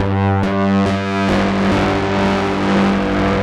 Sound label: Mixed PWM, 140 G, modular; modular madness